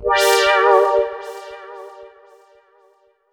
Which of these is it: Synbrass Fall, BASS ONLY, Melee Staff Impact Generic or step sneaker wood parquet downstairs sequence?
Synbrass Fall